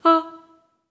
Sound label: STACC E4, Symphony of Voices, SOP STACC